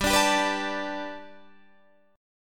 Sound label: G Major Add 9th, Guitar, Standard; strummed